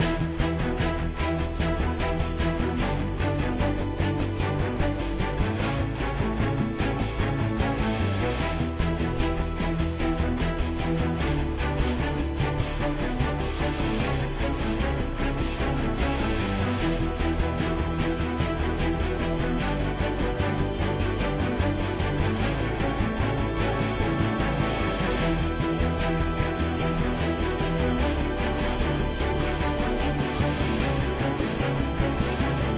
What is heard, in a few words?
Ambiant; Iron Man 3 2D Version